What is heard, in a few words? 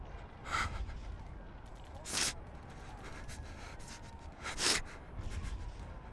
breathing